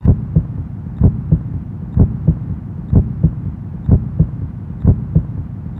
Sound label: crowds; NHL 2003